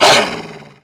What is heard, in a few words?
attack hit, dog